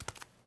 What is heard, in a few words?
bare step; Golden Crayon Sounds